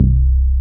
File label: bass Croma C 1; Best Service Gigapack 2